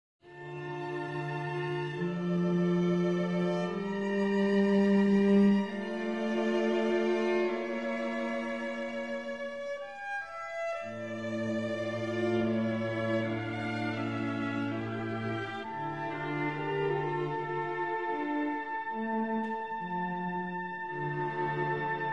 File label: Oboe Quartet in F Major, II. Adagio